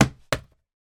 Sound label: vacuum cleaner upright attachment drop